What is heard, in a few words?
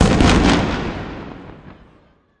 Music Frontend